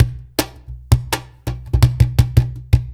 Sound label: Perc, Percussion Latin